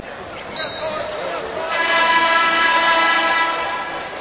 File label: buzer